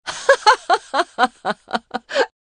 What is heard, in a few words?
laughing; infovox; ivox